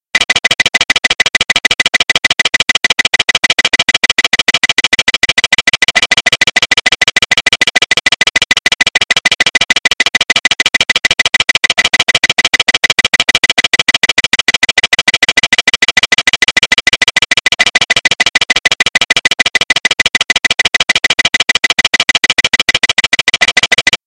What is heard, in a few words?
overspeed clacker